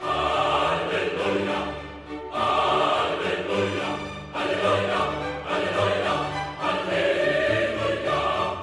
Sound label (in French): Le Messie Alleluia